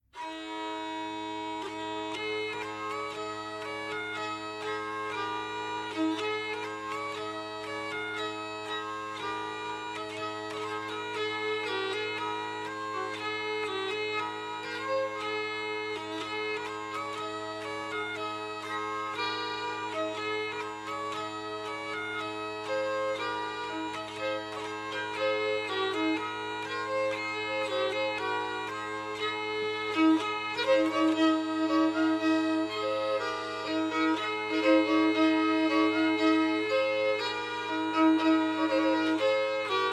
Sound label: Tavern; assassin s creed unity gamerip 2014